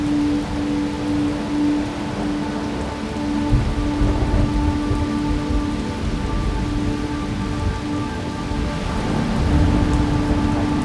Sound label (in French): Orage Ambiance nocturne Rolling Thunder